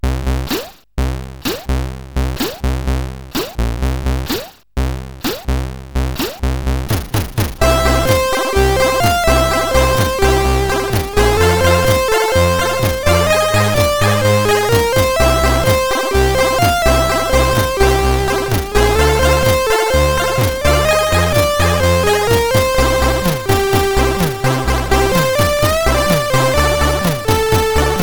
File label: Music Samples, sid, CSG8580R5